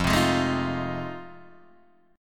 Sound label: F 7th Sharp 9th, Guitar, Open D